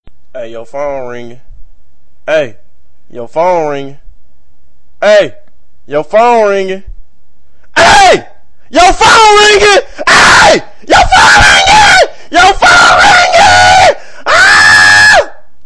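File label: hey phone ringing, ringtone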